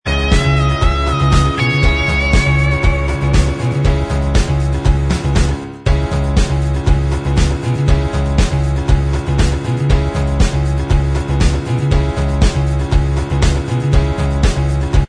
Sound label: Inst